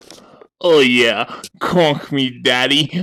sensual female orgasm

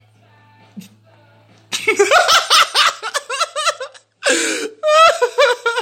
haahaha

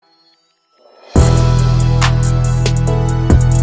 freestyle rap beat instrumental

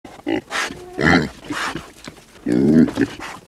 pig sound effect

